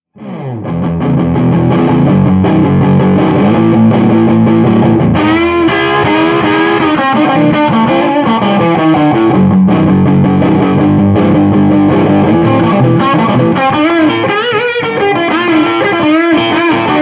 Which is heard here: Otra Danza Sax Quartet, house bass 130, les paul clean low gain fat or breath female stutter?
les paul clean low gain fat